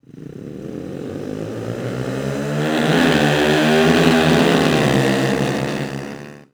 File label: Start, UI